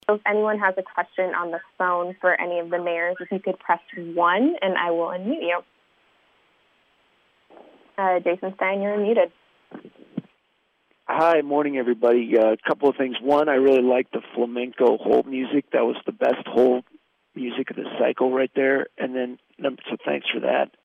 hold music

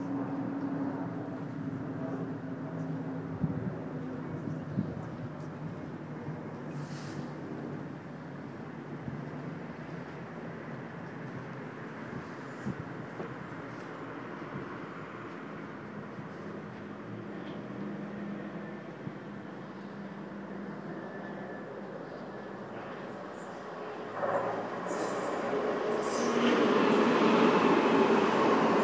summer morning at station with train passing; shortlands station

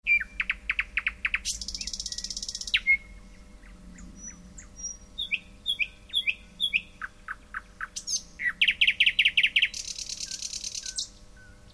nightingale thrush; mooste

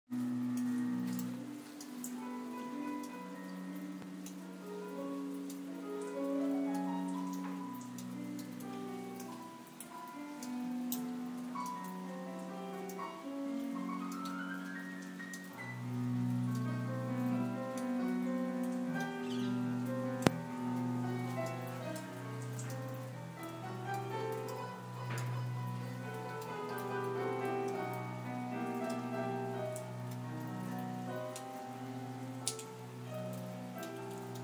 neighbourly piano

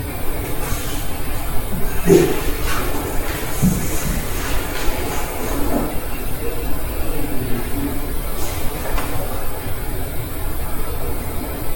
house 2nd floor

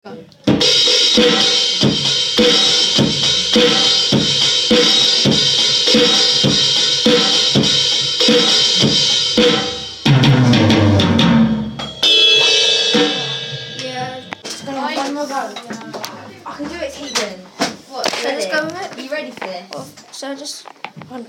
drums

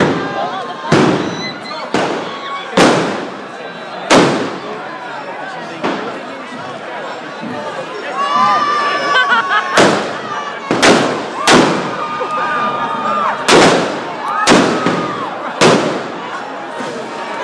bonfire bangs

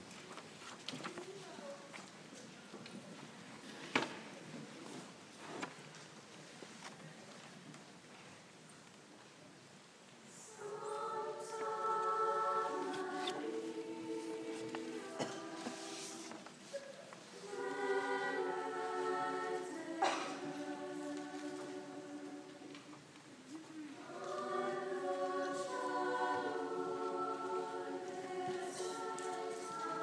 choir singing sancta maria at graduation ceremony; Notre Dame de Sion

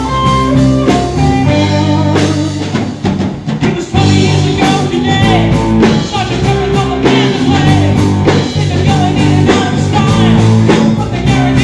free concert at hampton beach nh

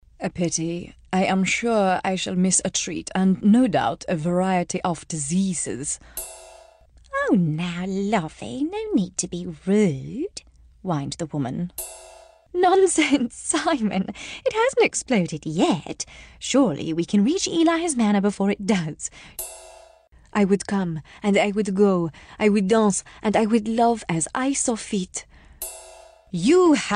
audiobook brass bone sampler

